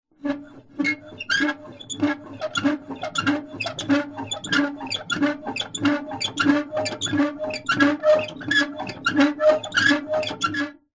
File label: industrial lever pump metal old handle pumping med fast squeaks stiff